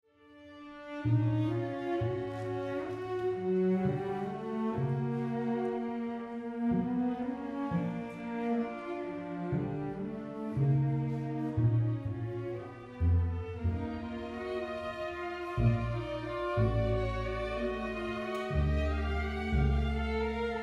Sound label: toccata aria chaconne